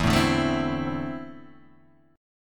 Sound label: E 7th Suspended 4th Sharp 5th